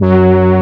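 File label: brass G2, SYNTHESIZER analog brass; AKAI S6000 CD-ROM - Volume 1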